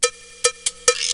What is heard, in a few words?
message, notifications; Hisense U820